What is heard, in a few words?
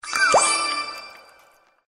scatter win; Candy Bona